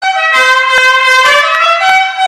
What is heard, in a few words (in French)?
dixieland 5 trompes